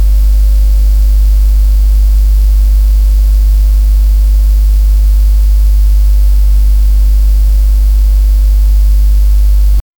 low steady electrical pan; panel

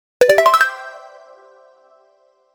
gba sound effect retro sfx; pokemon

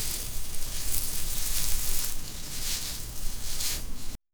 soft slicing on wood tofu; breeze

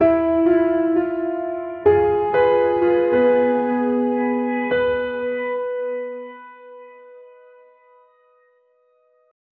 cinematic instrumental

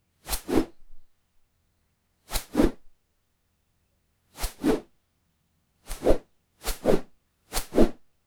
cloth whoosh fast turn

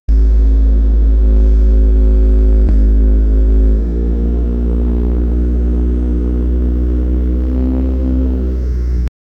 jaykara, india; bahubali 2

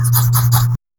writing with a quill

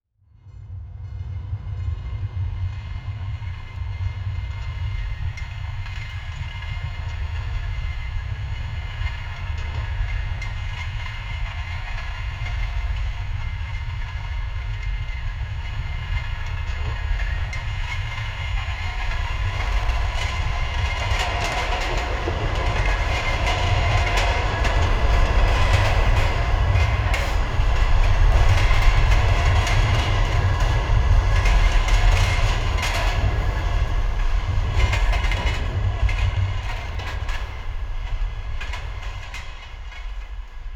close up sound of rhythmi, clatter

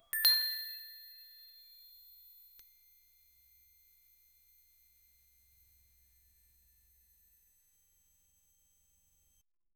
minimal coin; collect

